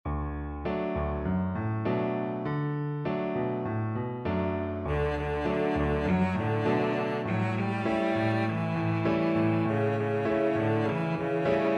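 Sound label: violoncello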